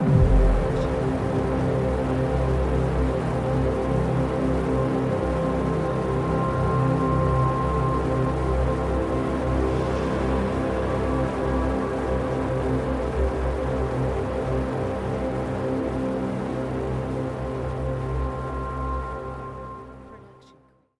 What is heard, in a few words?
Suspenseful Ident; soundscrate